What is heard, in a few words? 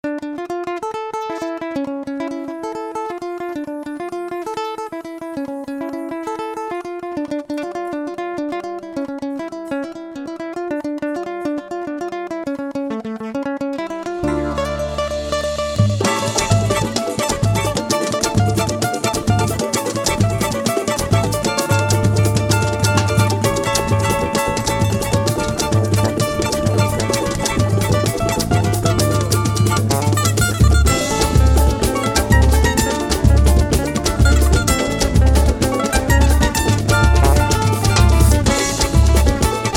instrumental